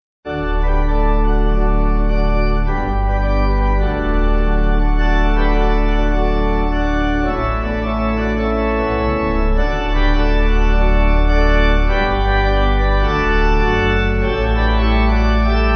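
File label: pipe organ hymn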